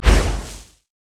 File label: steam golem static field hit; LoL; blitzcrank